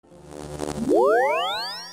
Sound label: teleport; Runescape